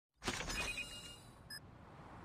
defuse; codm

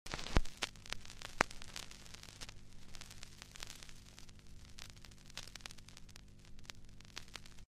vinyl crackle

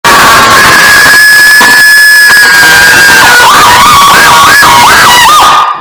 yell

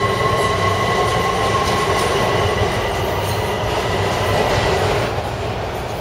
metro takeoff whine; washington dc; wmata

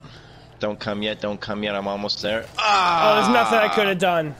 aghhhh

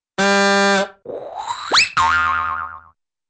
honk suction wheet and boi yoi yoi yoing

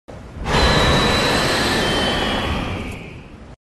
roar; mhrise; valstrax

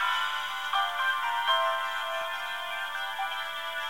getdown ringtone; gta iv

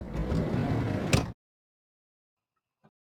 car door opening

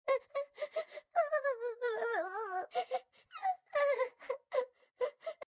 crying; sago mini; crying phase 1

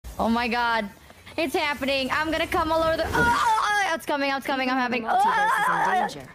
huuhhh

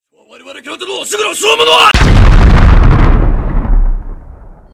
sumo boom

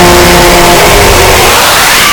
jumpscare; buy crypto

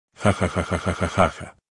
jajajaja; chappie

